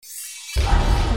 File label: Magical sound